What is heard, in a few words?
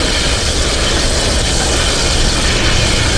grind cable; terrains; Tony Hawk's Project 8; XBOX